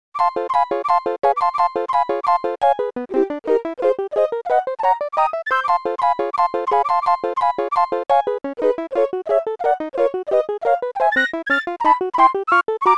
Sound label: Nokia Tones; Windows Phone apps